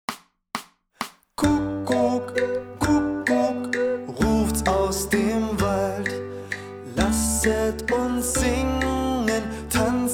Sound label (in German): Kuckuck Kuckuck rufts aus dem Wald; Titel 37 – Kuckuck, Kuckuck, ruft’s aus dem Wald